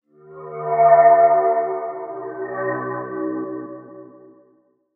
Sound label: Pad; Dubstep